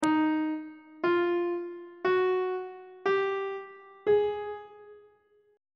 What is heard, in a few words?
e flat 3rd interval pitches on treble clef